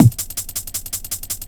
Bass Drum 162

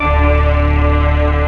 SYN TV; Best Service Gigapack 1

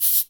CABASA MED, SHK+RATTL; Spectrasonics; Heart of Africa

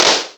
claps, Yamaha TG-500

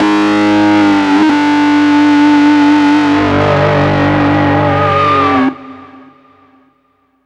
Grunge Lead; Dr. Fink's Funk Factory

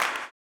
CLAP, blues & soul; ILIO; Vocal Planet

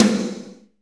SNR, Snares, Roland; Northstar - Drumscapes Roland

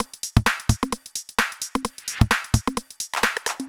130 Hard Mean No Bd, Drum Loops 130bpm; Zero-G; Soundclash Nu Dance Electro; Acidized Wavs

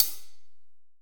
AMB CLHH, Drum Kit, AMBIENCE; AKAI S6000 CD-ROM - Volume 3; AMBIENCE KIT2

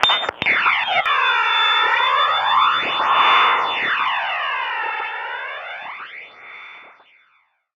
Radio In Flange, Short Wave Recordings; Sonic Foundry; The Electronic Music Manuscript Collection; EVP Short Wave Recordings

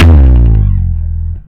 FUZZ SL, Bass Legends, SLIDES; Spectrasonics; ALL SLIDES

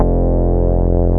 BAS Mini; Best Service Gigapack 2

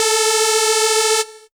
saw lead synth; Ueberschall; Techno Trance Essentials

